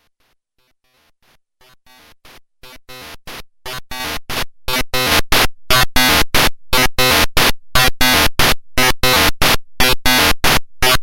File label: NOISE